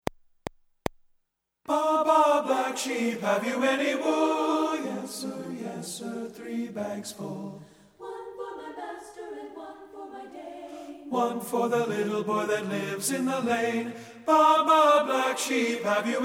TENOR; satb

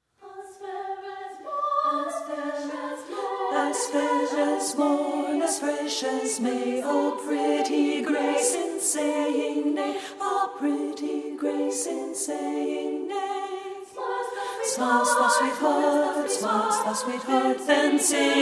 ALTO

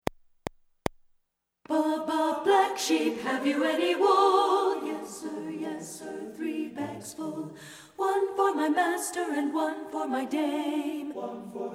alto; satb

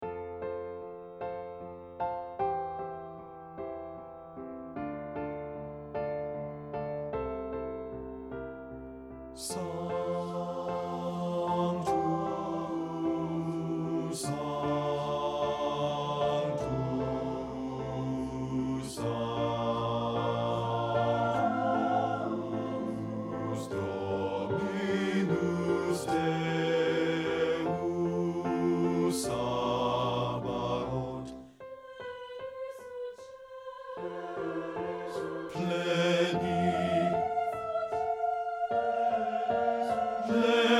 BASS; satb

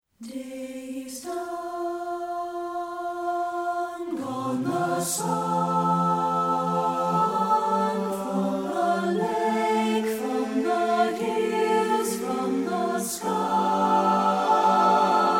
Taps vox, satb; partbypart

